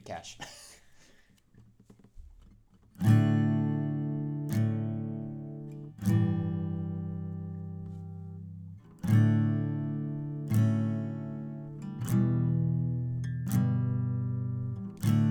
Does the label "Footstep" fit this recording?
No